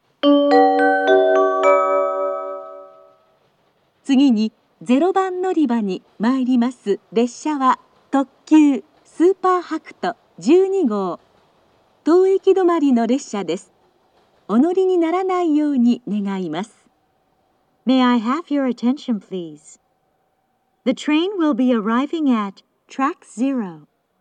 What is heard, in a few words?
limited express stop, kyoto station; sp-hakuto12